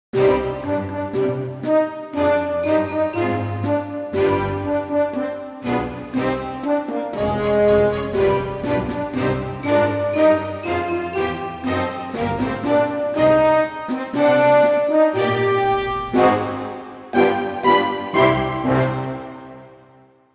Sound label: brass drums